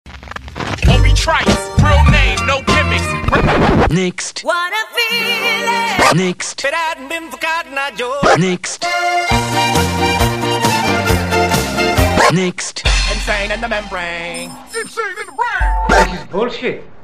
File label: Jingle